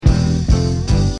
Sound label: Girls Opening chords